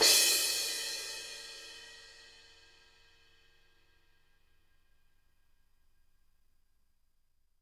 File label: CRSH, CRASHES